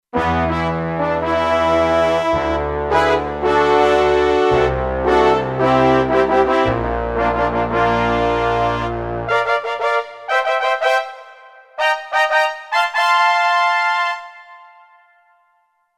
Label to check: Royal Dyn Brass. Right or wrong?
Right